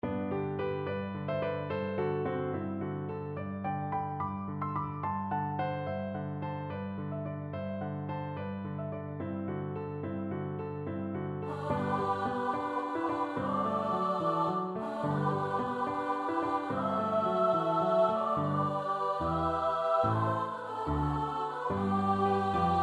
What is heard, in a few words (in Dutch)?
bas; Elgar (Edward); Scenes of the saga of King Olaf